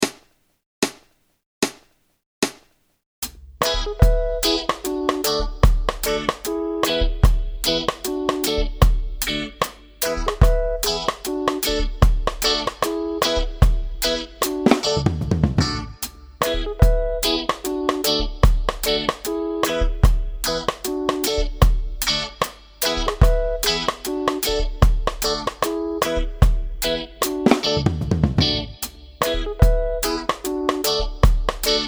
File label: reggae, bass playalongs